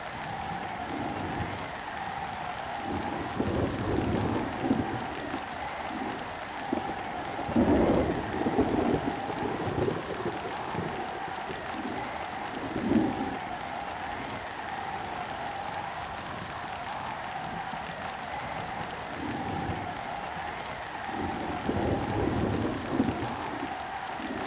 Ambience - Storm

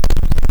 tricycle

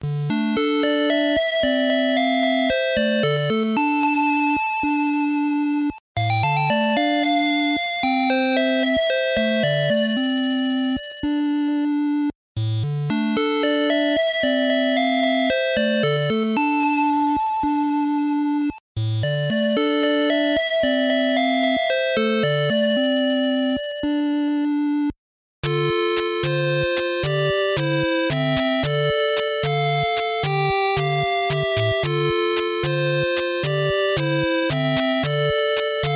8-bit Remix; Soulcaster II

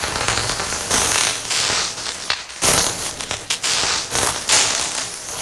elec lightning magic arc loop; Infernal Radiation

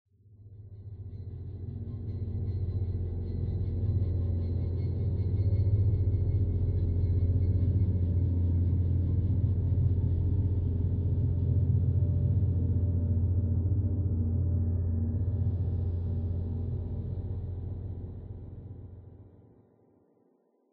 Interior Drone; Sinking City 2019 pc gamerip

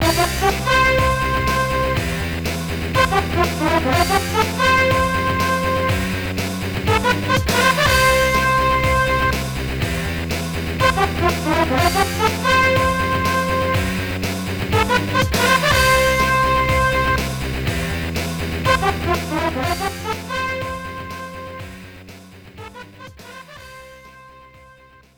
Match Won; Super Sidekicks 3 The Next Glory; Neo Geo arcade

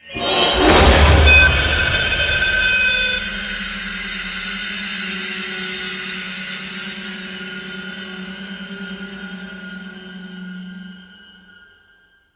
Sting

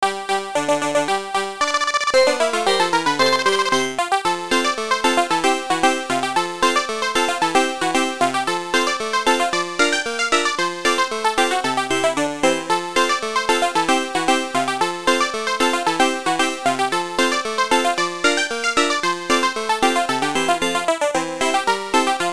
Ingame Music; hopping mappy

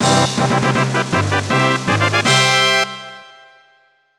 Fanfare; Furnace Fun

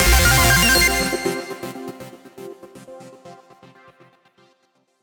Duel Draw; Yu-Gi-Oh! Rush Duel Saikyo Battle Royale

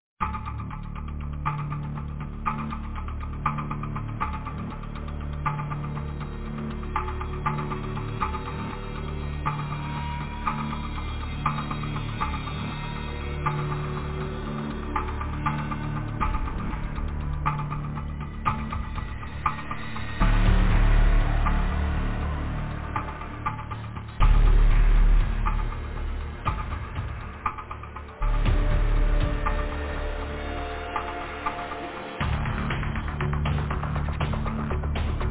Ambient Short With Percussion; The Reckoning; Destiny 2 Whisper of the Worm

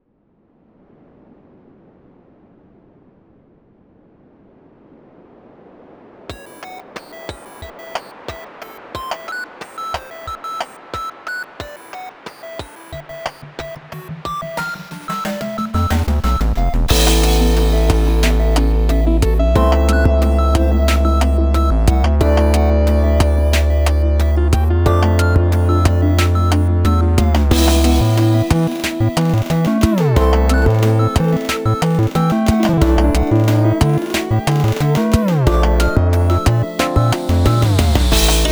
Freefall; Pilotwings

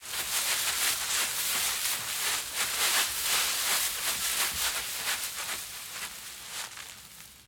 Footsteps long grass run; The 39 Steps

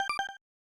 Item Chime; Apple; Flintstones The Treasure of Sierra Madrock; SNES

